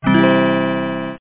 Enter Chat; FirstClass; VillageSettings 3.80

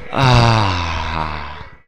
Ahhhhhh; Ahhhhhh Hack; Hacks96

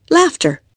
laughte

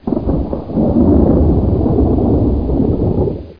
THUN; hell

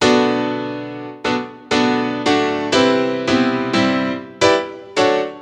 Synt

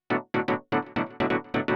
synth house 125 A; eJay; Keys

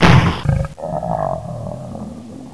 Hit demon holding razor; Speed Bump

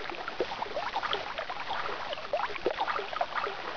WATER; CHASM

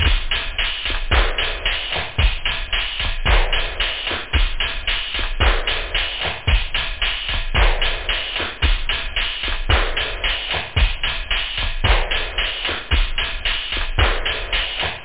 TECHNO; da capo vol1; instruments

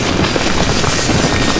gravel, impacts; RallyC

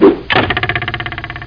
arrow

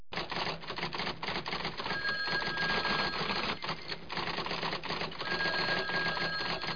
office; Image Bonanza; ondajob

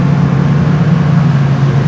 dam in; Team Alligator

